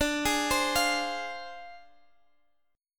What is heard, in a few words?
D Minor 7th Flat 5th, Guitar, Open D